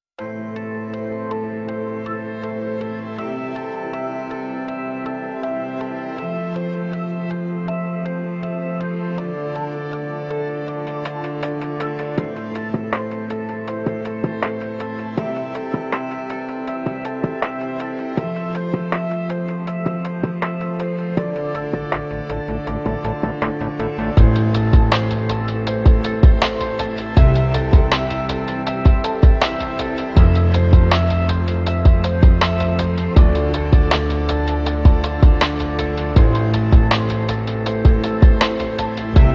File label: music